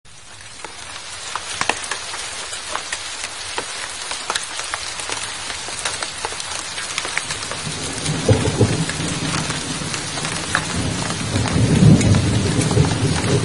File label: Rain Sounds; Music; Soundscapes; For Relaxation